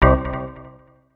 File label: out of bounds; message tones